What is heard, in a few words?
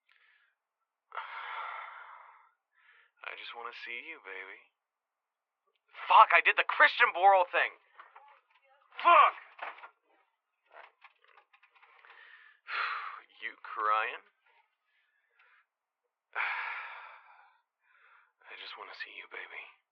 Phone